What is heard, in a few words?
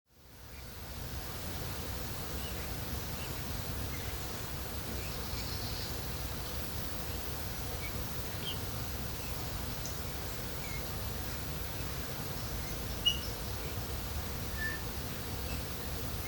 Pyrrhula pyrrhula; SETE